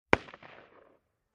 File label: M-16 Long Barrel Machine Gun Single Shot From Distant Point of View